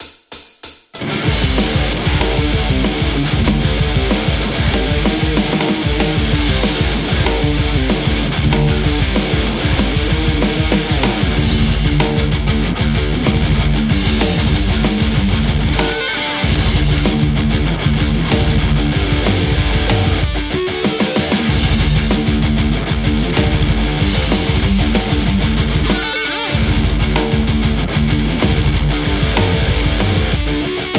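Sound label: Telecaster; metal; overloud; th2 guitarheromod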